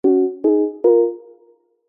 game sound soft warm subtle positive ascending x3

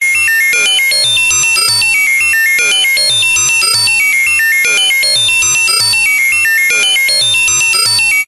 science fiction machine beeps lab tech low fi; zapsplat

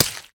Stab Knife; little robot sound factory

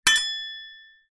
impact metal light small scraper

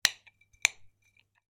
fork dig into fries on plate

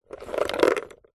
ping pong balls in plastic tub movement

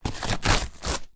Cardboard Tear

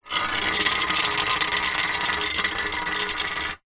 household hoe metal drag on concrete

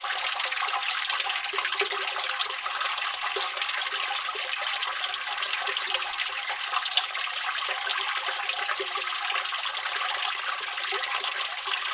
nature water running down drain in road

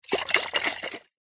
alcohol spirit bottle short shake movement splosh; food drink

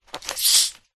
cola bottle open lid hiss; zapsplat

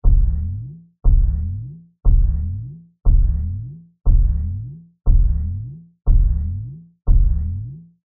cartoon impact bounce heavy multiple; zapsplat

